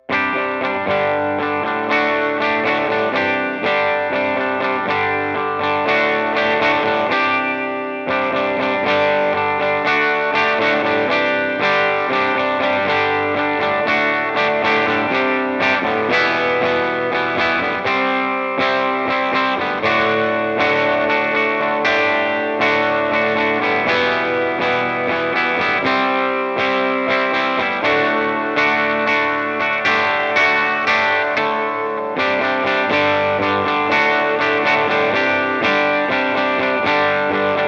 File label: Clean 1973 SG Special Pickups; Revel Pickups